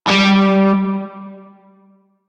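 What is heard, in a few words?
Shawzin, Octave Two G; Music; Warframe; Narmer Shawzin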